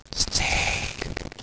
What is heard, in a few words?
start; Snake